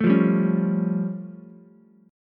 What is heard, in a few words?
A Augmented 7th A, Ukulele; strummed; JGuitar